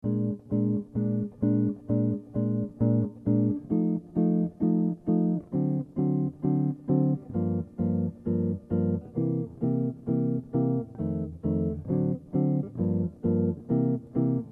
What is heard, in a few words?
blues